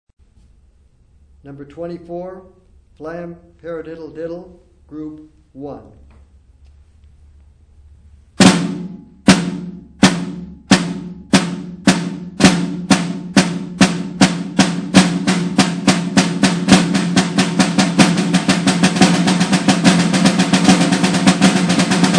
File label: snare rudiment